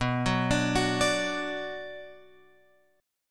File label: B Minor B Guitar Open F; arpeggiated; JGuitar